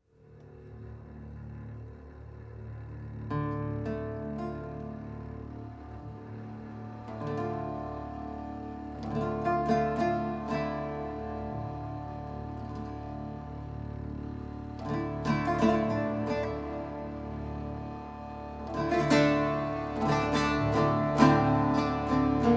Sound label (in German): Vorspiel